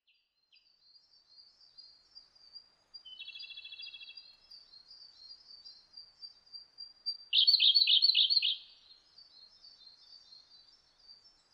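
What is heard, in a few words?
koruri, Siberian blue robin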